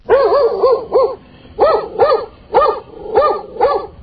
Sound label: dog barking